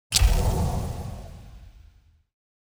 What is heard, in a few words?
ui disaster